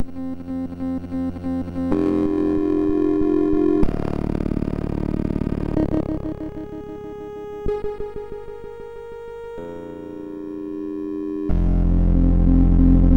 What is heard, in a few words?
Ambience, sid MOS6581R4